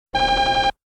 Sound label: Door Lock Beep; BSG